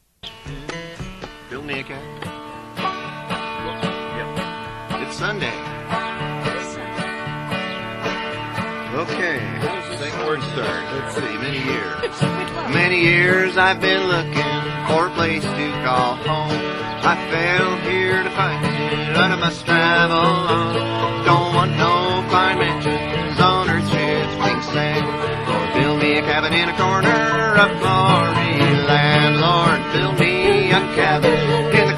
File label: guitar/vocals, mando/vocals, banjo/vocals; Build Me a Cabin